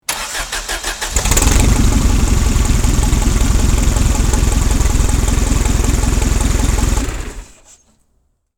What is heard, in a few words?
Forklift engine ignition and shutdown; construction vehicles